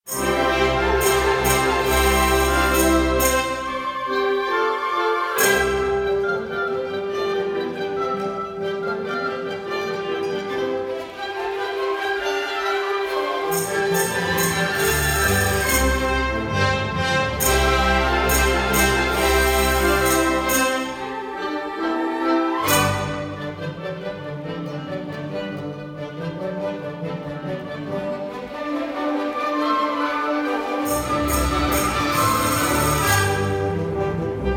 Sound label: Village Dance Song